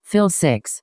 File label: Fill